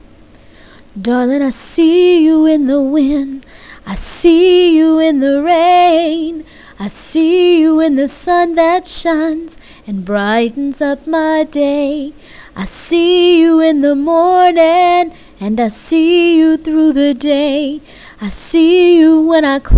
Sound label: hmm